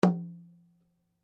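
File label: Drum Flat Skin piano, Drum heads isolated from drum shell; Musical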